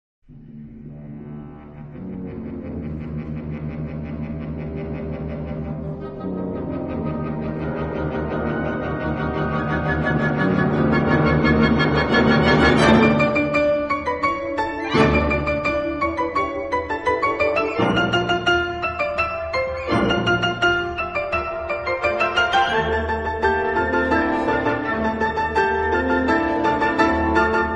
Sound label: Concerto for Piano and Wind 1st Movement